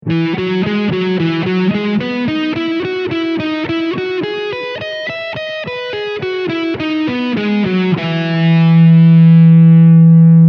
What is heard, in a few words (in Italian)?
Mi arpeggio, guitar; guitar club n2 2015